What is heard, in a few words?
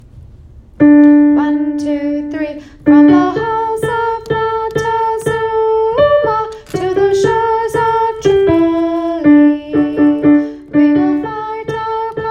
Marines soprano, Military Songs, Chorus